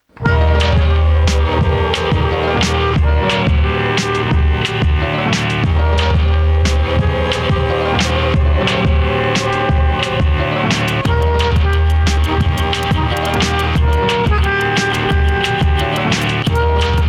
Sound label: Melodica